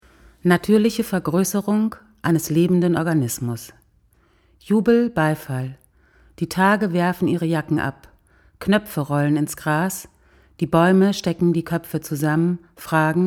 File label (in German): jubel beifall